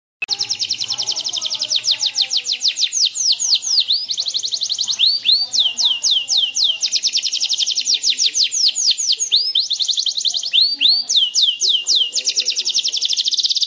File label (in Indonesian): suara kicauan kenari standar